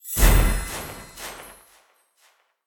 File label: roll result; jumanji